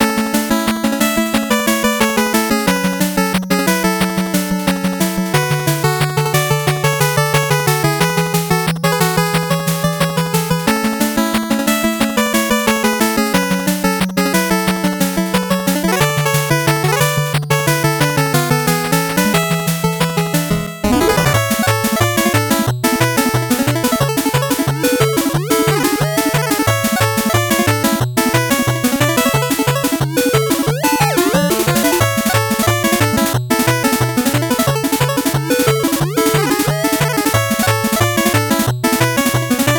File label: 8bit bgm normal